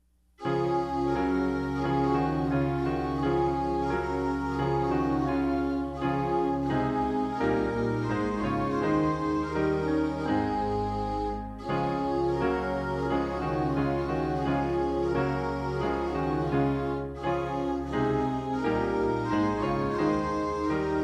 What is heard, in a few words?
SDA HYMNAL SONGS TUNE